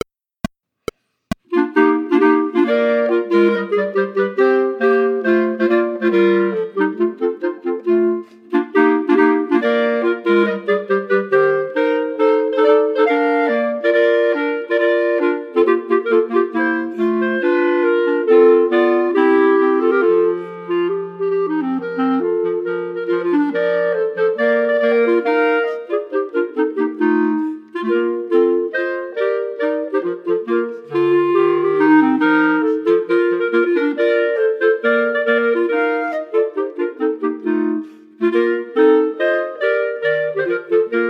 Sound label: Equale Ensemble minus 3rd part; Mendelssohn